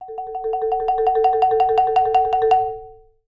Marimba roll D4; Sampleheads - Dave Samuels Marimba & Vibes

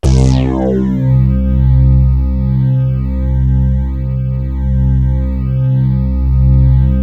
SYN LEAD; MORPHEUS

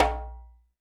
percussion, Roland TD-20

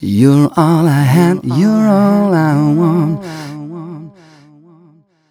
m 129 mel e, Vocals

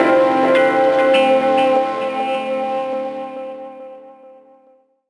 PAD GTR FX; Rawcutz; Nu Forms

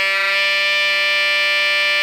ALTO GR D 4, Sax

